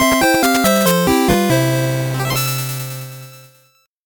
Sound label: 8bit jingle normal